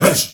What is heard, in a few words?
HEY HEY; Heart of Africa; Spectrasonics; Heart of Africa 1; CH. SHOUT2